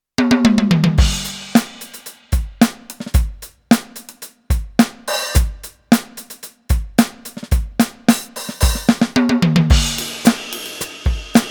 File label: Tight Pop kit; alesis nitro ultimate